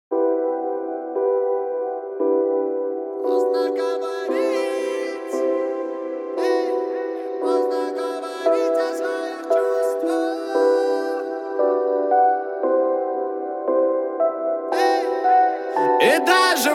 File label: Low Bass